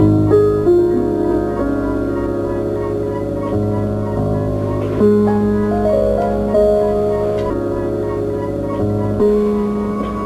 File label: piano ugly, Sad; Loops